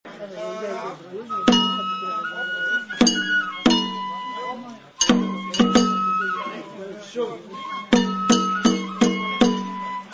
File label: banjo